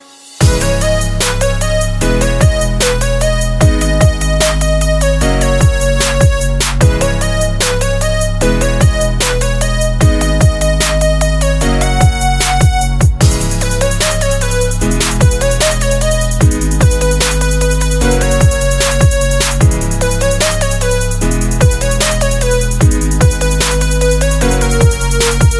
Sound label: English Music Ringtone